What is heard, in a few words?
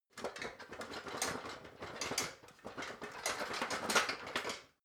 ladder metal rattling long